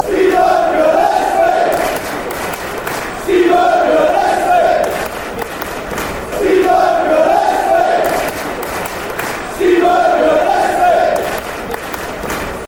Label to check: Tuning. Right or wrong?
Wrong